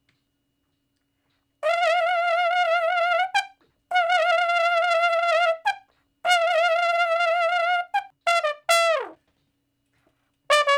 Trombone Shuffle (E); Sonic Foundry (Sony Creative Software); Horncraft for R&B; Solos

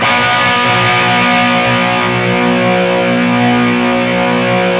C 4 SOLDAN, Guitars, SOLDANO ROCK; Spectrasonics; Hans Zimmer Guitars VOL-1

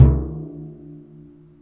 Surdo, Perc; Akai MPC500; LA Perc

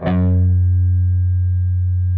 PRS G, Guitars, LEAD; Spectrasonics; Hans Zimmer Guitars VOL-1

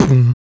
Human Perc, Body Perc

